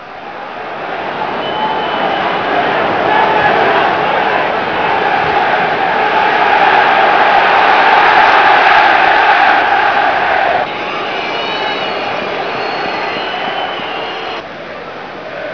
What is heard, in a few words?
crowd; stadiumgsu